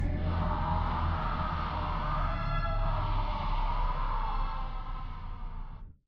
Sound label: screaming; at fake peppino